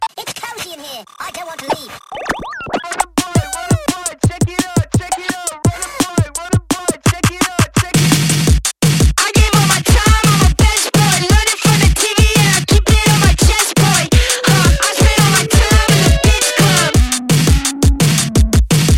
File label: house